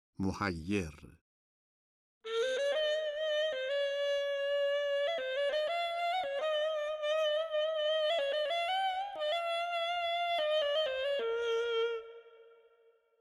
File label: Mohayer Mahur Ney; Dastgah Mahur